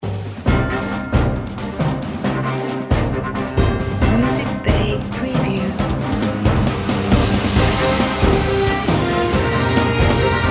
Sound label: Breaking News